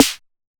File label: SNARE ONE SHOT